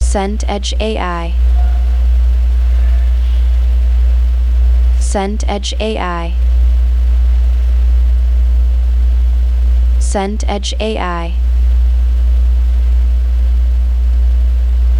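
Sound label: ambience bar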